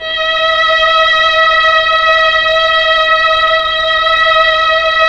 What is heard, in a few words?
STR, Melo. Strings, Slow Strings